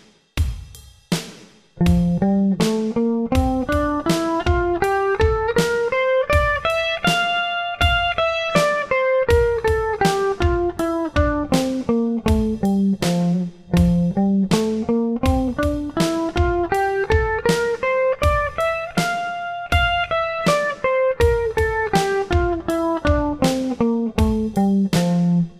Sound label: f major 8th